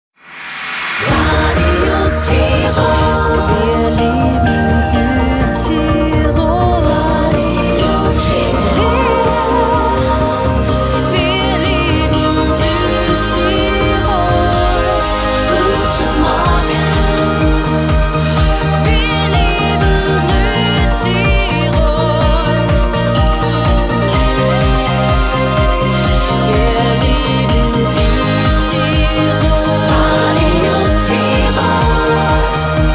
Main Theme Pop Disco

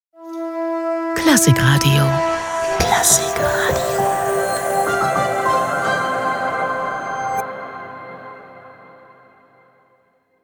Station ID

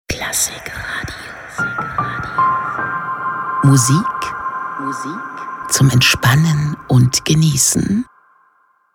Station ID

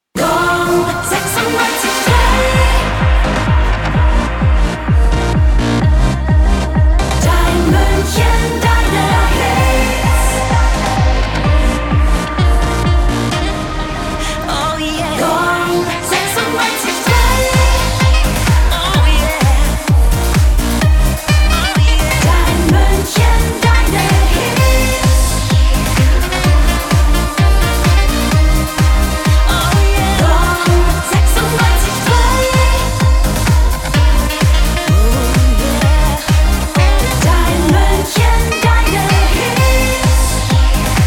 Main Theme House; Gong 963

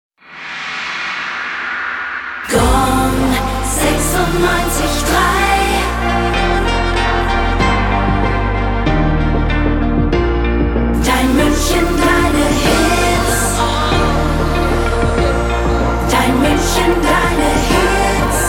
Main Theme Slow Hop; Gong 963

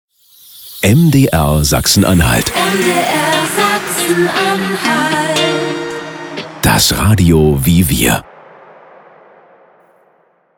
Transition medium to medium

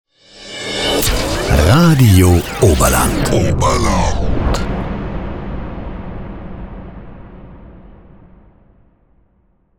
Universal Transition; RADIO OBERLAND

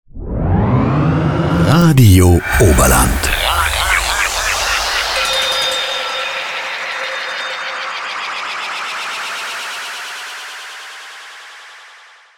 Universal Transition; RADIO OBERLAND

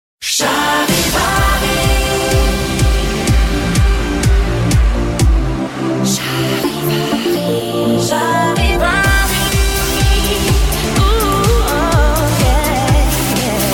Main Theme Pop Dance; Charivari

